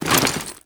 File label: foley step, soldier; combine; soldier common